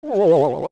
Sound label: Shiver; Goron shivering